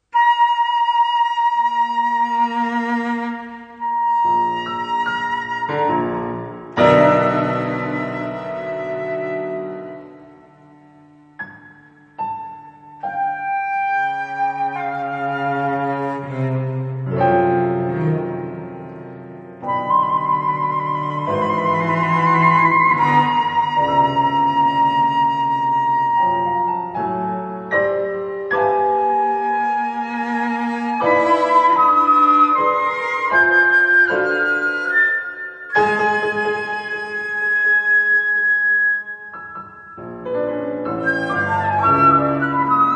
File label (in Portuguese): para flautas, violoncelo e piano; Imagens rupestres para flautas, violoncelo e piano (1996); Harry Crowl